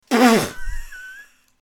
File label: burst laughing funny sound